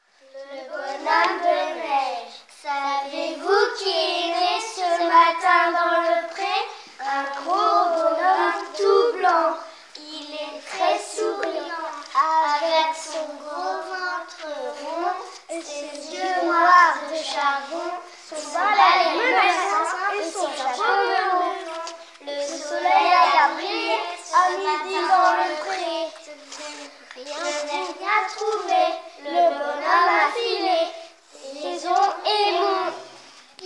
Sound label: Vocal